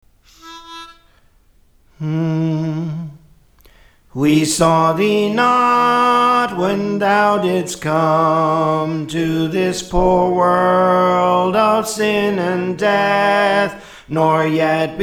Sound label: Soprano; Praise For The Lord Training